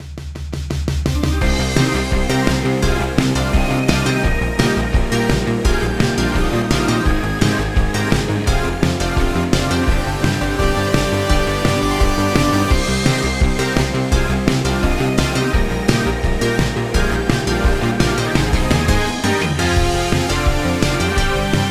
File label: Game Over Defeat; Auto Age Standoff